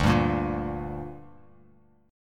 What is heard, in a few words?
D Suspended 4th Sharp 5th D down Bass Standard, chord; strummed